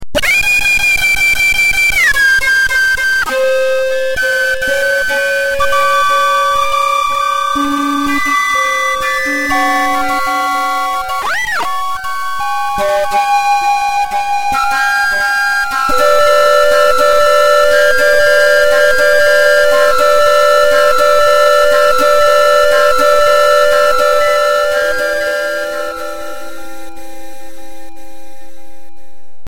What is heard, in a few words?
flute micro, live Flute Synthesis